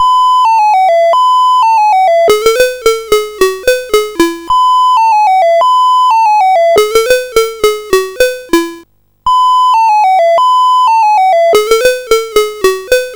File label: Musette, CSG8580R5